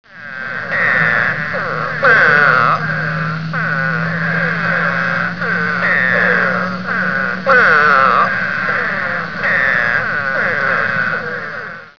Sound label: spadefoot toad